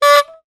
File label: cartoon horn hooter honk single; zapsplat